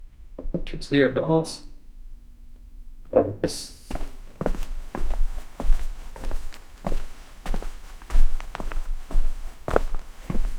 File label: footsteps on an old dirty, carpet